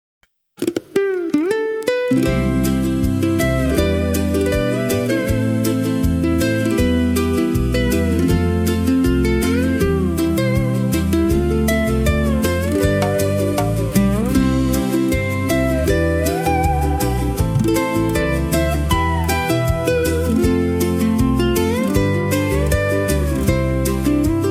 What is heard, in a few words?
ukulele